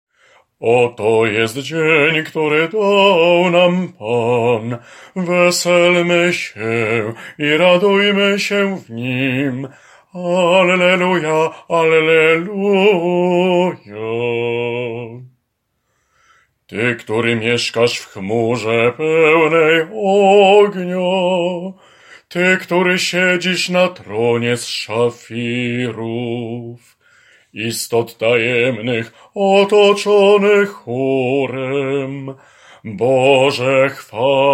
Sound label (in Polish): BAS